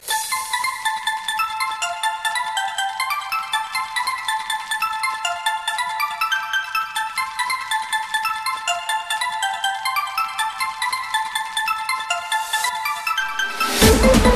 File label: Sms Africa